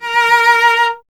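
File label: strings cello A#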